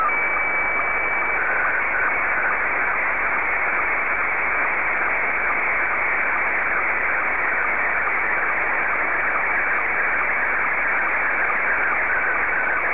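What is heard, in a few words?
Scottie 1, slow-scan television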